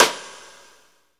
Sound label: SNR, Reverbs; Club-50 - Foundations